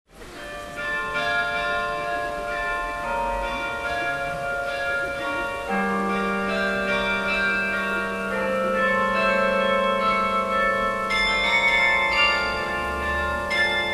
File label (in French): concert de carillon; CHAMBéRY